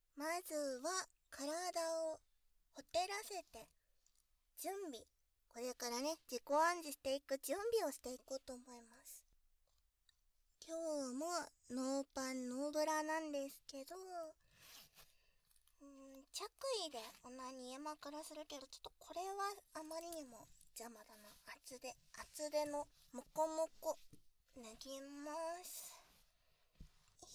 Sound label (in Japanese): 準備体操的オナニーでオマ●コを整えますッ バイノーラルマイク収録